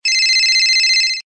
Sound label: phone; crimecity